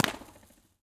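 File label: San Diego Supercomputer Center, Impulse Response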